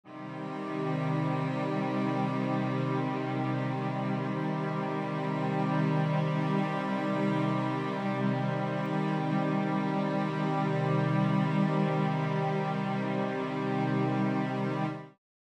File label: C Minor Chord, String Ensemble